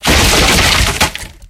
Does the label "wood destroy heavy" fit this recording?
Yes